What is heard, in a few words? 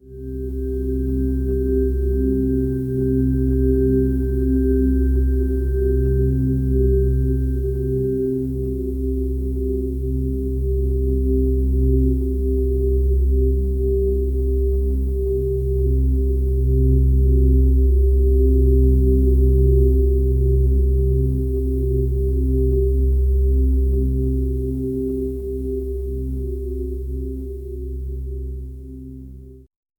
healing ambience, tranquil